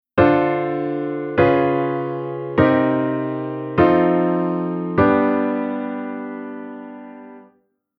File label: Chord Modulation Ext V7